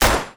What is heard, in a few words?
Deagle